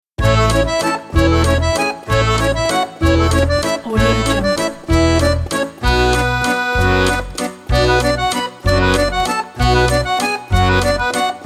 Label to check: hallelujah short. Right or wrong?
Wrong